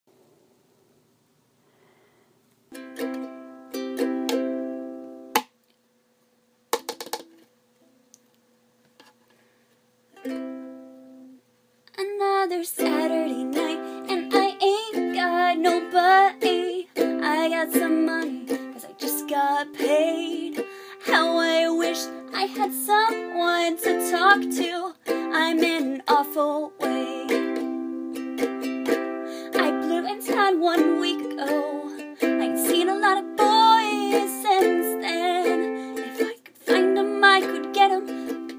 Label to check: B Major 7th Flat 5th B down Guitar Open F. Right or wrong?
Wrong